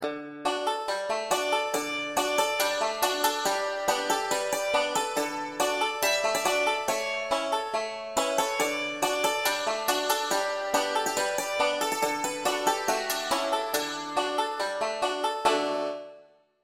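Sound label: wreck of old 97 accompaniment with melody, banjo; beginning 5 string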